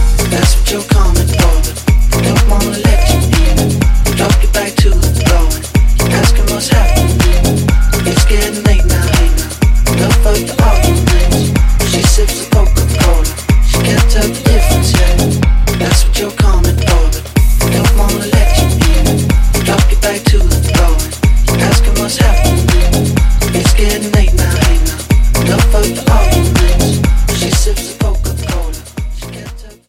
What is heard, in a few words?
Bongo